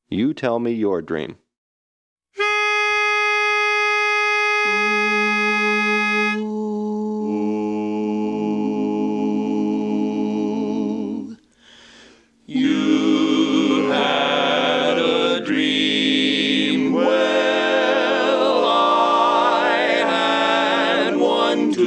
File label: Lead